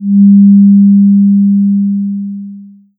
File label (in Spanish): Envolvente de amplitud; eso que llamamos musica parametros del sonido